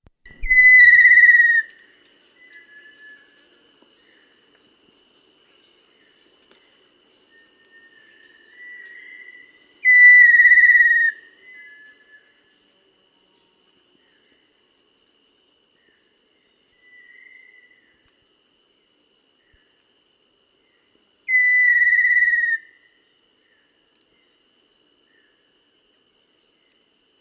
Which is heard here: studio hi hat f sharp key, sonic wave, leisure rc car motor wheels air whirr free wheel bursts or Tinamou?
Tinamou